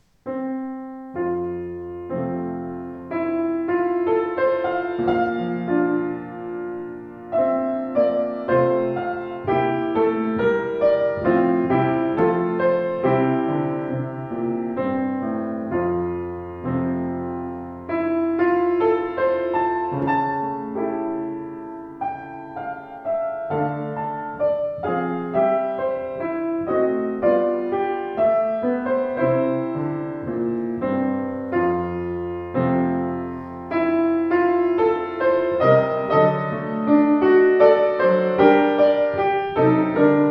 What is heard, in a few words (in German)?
Schimmel Buche, klavier; 115 schimmel buche